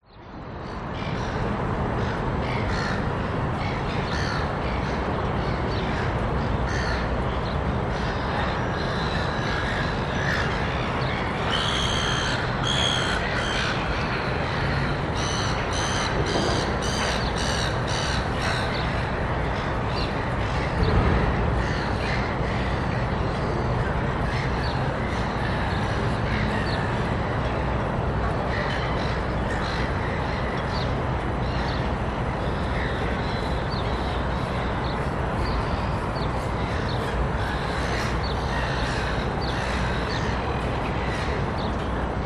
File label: public places city darling harbour sydney distant city hum pedestrians seagulls; australia